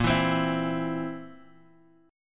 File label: Bb Suspended 2nd Flat 5th Bb down Guitar Drop D